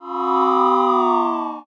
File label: cheer far